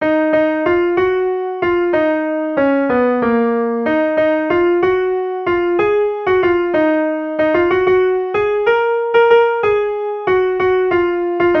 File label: piano